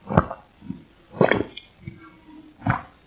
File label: drinking female